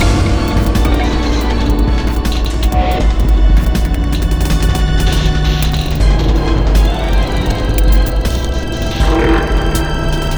Steelworks Medium; rive wreck hack die retry; ps4

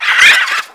mole; Birthday Party Bash; Wii; water balloon

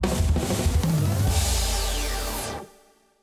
Jingle Warzone Ultra Rare Mission

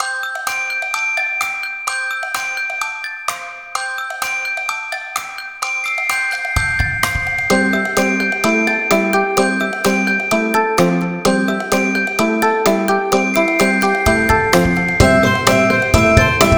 Music Happy High Pop Advertising Jingle; Scram

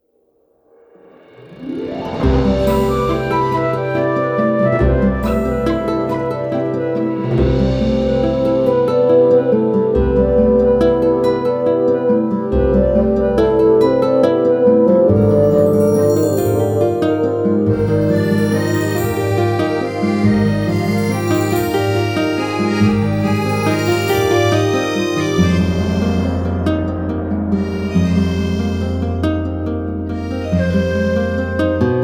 French Travel Music; pirates of the burning sea game soundtrack 2007

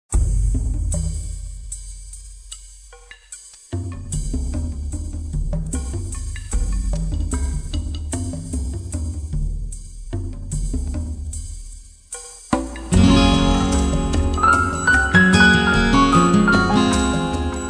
Peaceful Forest; Ragnarok Online

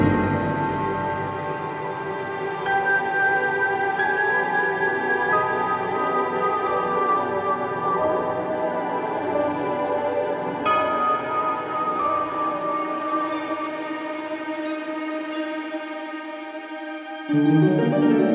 Mystical House Short Loop; Dual Blade Battle of the Female Ninja

